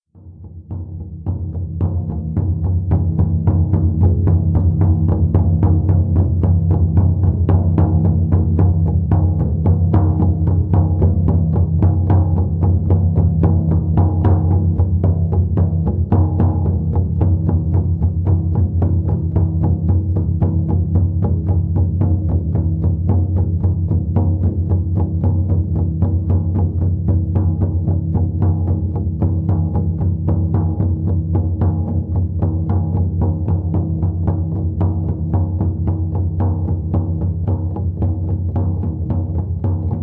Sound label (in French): musique chamanique tambour